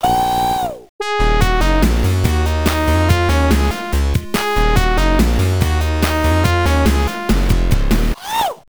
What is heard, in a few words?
Dance Attack (with voice)